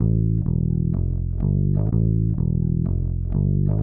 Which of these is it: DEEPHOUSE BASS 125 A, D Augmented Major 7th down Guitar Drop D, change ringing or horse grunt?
DEEPHOUSE BASS 125 A